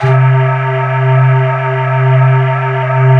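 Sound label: U220 calliope; Greytsounds; Scott Peers Sound Engineering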